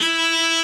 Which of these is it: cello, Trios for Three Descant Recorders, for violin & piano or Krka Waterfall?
cello